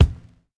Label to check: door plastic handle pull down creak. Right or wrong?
Wrong